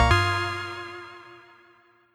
Key Pickup F

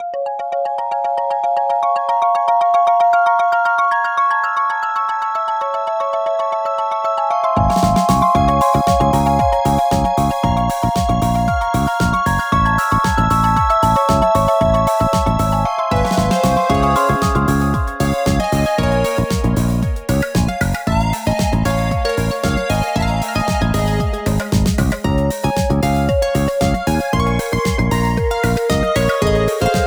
woods

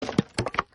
skel die sound; Arc of Templar

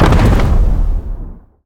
ice hit low

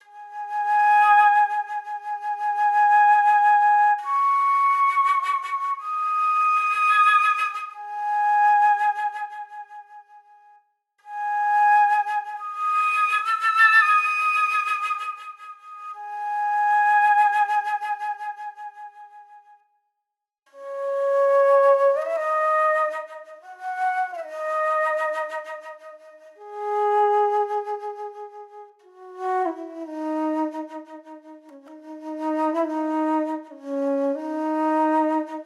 Page Flute Song; Elden Ring; PS4; Xbox Series X/S

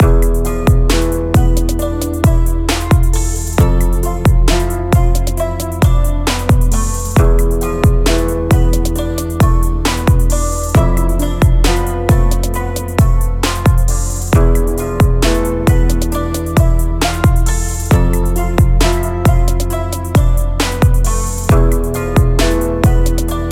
Hiphop Background Only Clean; Nyakuza; Empress

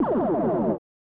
Laser Gun

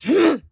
flyer attack

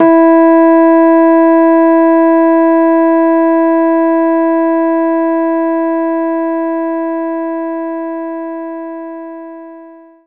Rhodes E3

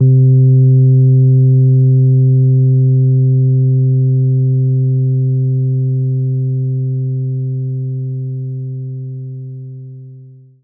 Rhodes C2

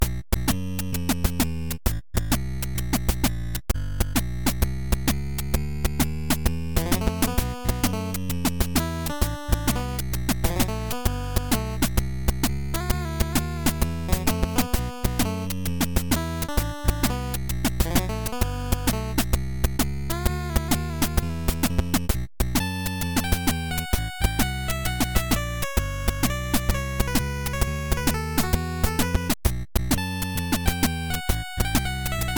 jazz dungeon; Jesus Christ RPG

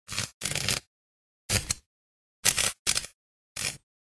Mecha dwarf Overheat Loop; Dwarves

